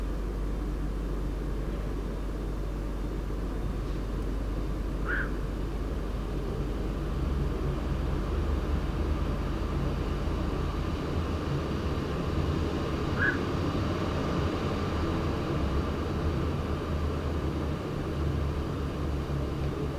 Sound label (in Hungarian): bakcsó hangja